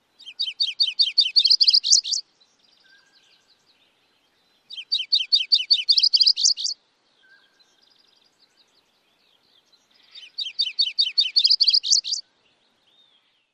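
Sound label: songs, bird; CA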